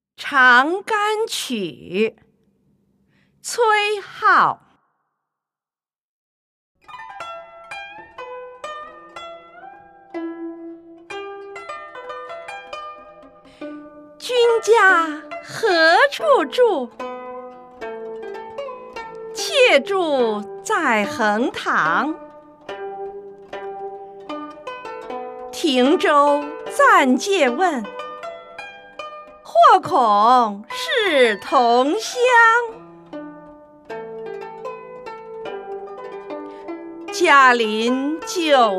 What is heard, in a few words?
music; chang gan qu